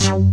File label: BIG PAN BS; Pro-Rec - Synthsonic; BASSES